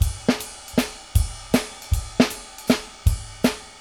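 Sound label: RIDE right; Sampleheads; New York City Drumworks VOL-1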